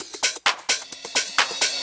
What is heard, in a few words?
Groove 130; Dance Mega Add On Grooves